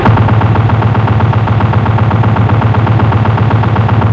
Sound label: TRUCK